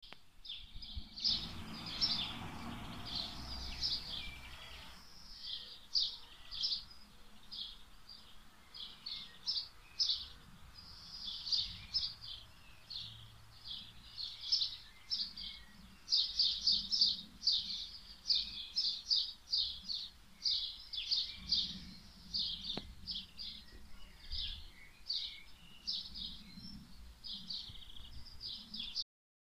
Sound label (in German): Sommeratmo Vögel